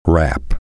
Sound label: rap